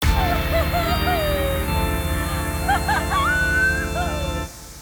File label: Retry Jingle; Hallow